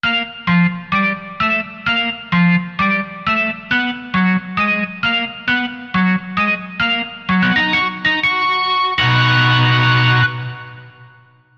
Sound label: Organ Teasers